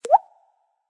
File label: waterdrop